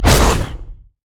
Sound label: pounce; nidalee base